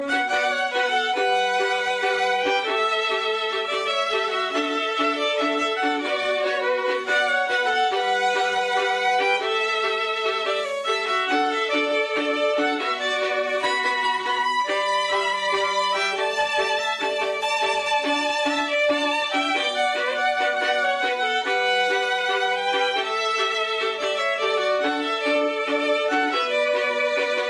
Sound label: violin remix